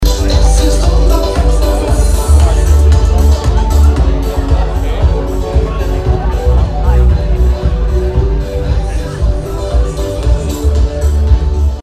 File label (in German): Blitze